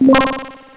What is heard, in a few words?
click